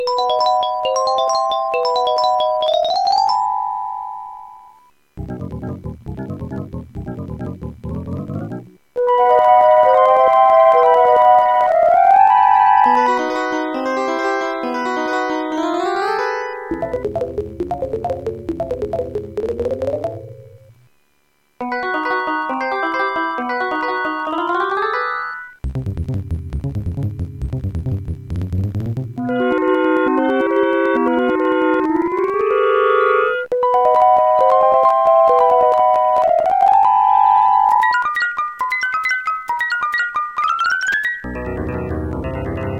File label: instruments